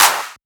Clap; FLPRO

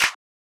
Clap

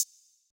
HiHat

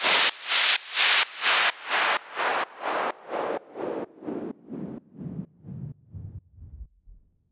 Fall Side Chained

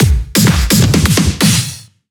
EDM Drum Fill; FL PRO

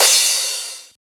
Crash

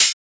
Open Hat; FLPRO

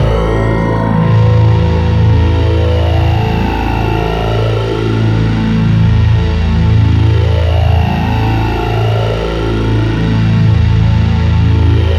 Ethno Voco Pad

